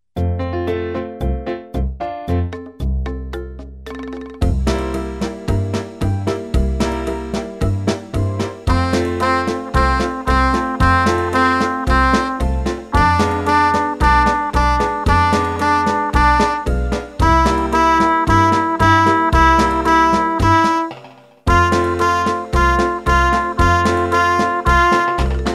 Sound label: Rumba, plus low part